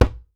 djembe

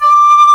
SHIAO D4

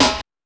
Techno C; EMU XL-7

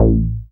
Bass SH-101

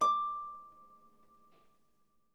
HS D6, Harp; Miroslav Vitous - Percussion & Harp

